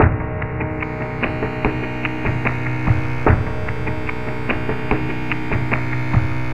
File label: pod landing